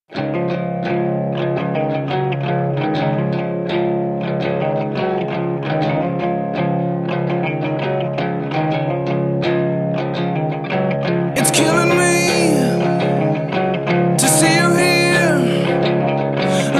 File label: Plexi Mod